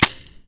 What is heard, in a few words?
vm from back clip, guns; vm from back clip normalized; vm-68